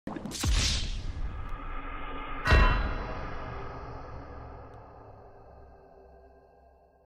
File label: Death Sound Effect; sonidos de muerte; GTA 5